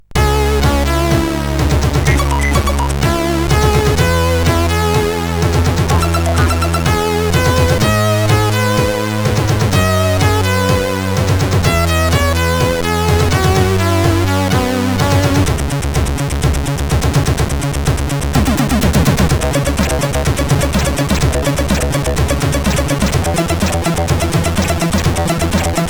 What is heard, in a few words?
sid CSG8580